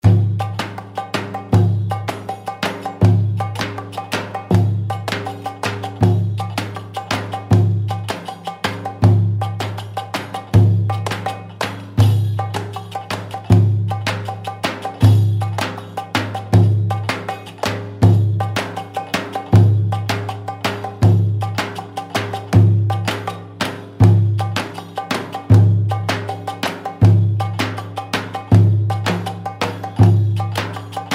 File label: malfuf 80 bpm